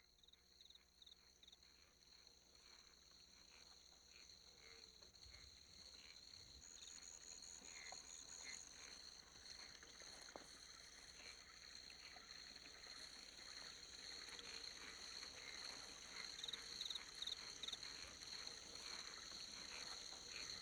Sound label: Amazon Rainforest Suite; Nature's Tranquility - The Peaceful Sounds Of Serenity